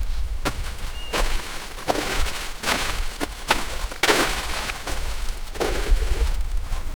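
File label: man skiing down a mountain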